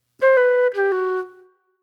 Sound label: Sparse Flute